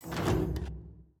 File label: gate close; factorio 2.0.55